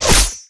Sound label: imp sword